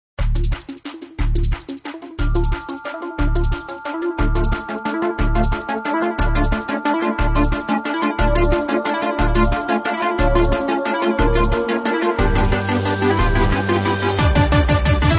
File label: epic electronic remix